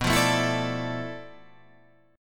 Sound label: D Minor 6th Add 9th B